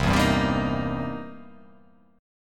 C 7th Sharp 9th, C, down; strummed; chord; JGuitar

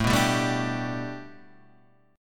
A 7th Suspended 2nd Suspended 4th Guitar Standard

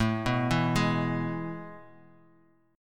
A Major 7th Suspended 2nd, Bass Standard; AM7sus2 arpeggiated; 17,14,14,13; JGuitar

